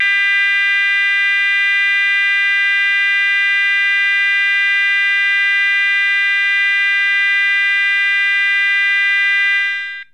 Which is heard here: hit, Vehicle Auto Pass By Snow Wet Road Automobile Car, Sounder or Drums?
Sounder